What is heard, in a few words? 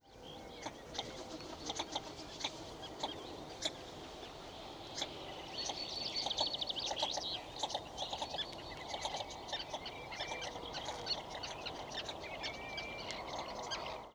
Northern Shoveler Sound Spatula clypeata